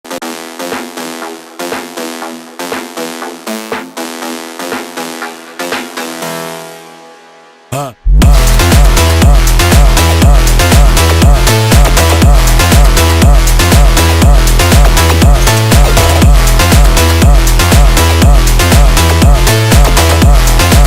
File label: phonk